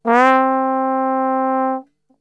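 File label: C 3 trombone glissando, Brass Super Section; Best Service; TRMB GLISS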